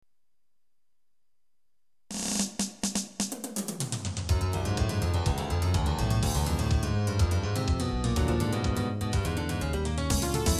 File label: busy piano